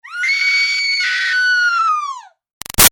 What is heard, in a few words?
Scream Female Terrified Shrill; Screaming; Big Room Sound